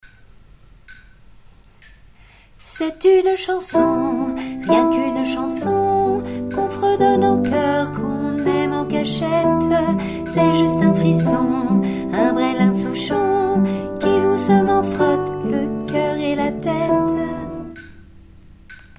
basse

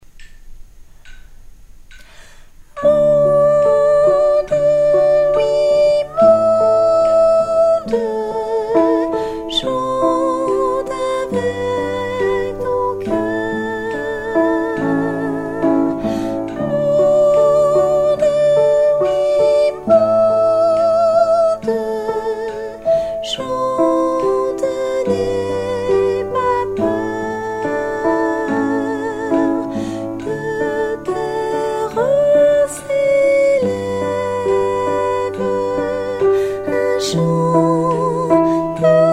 et si le monde chantait soprano

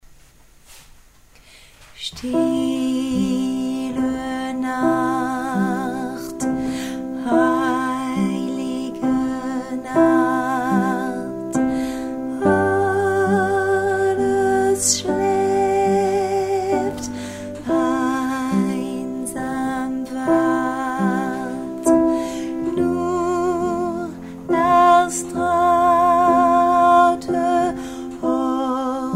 douce nuit basse couplet allemand

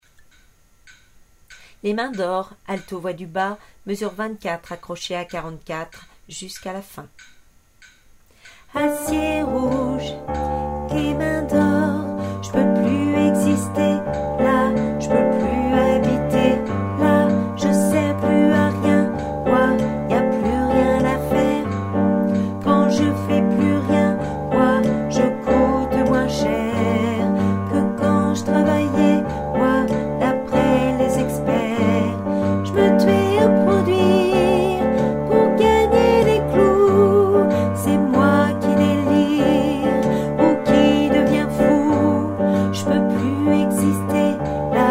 alto refrain

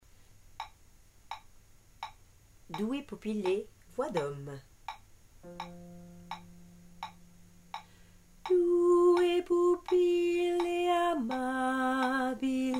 hommes

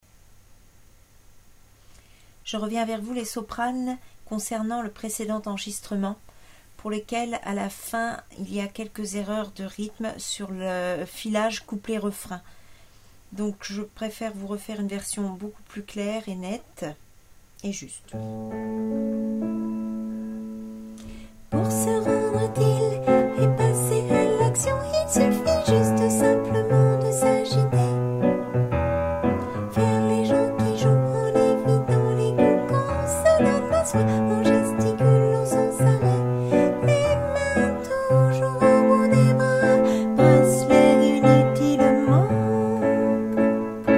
sopranes

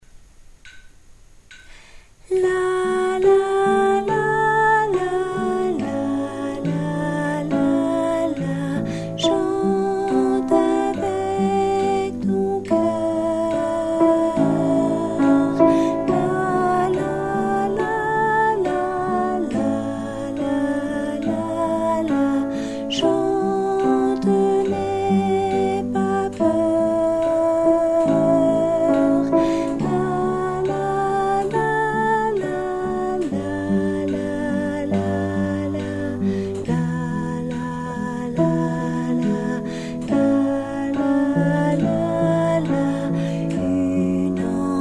alto

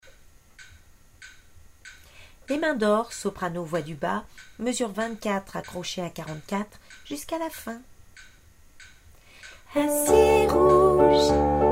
soprano refrain

